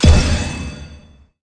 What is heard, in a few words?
lvl up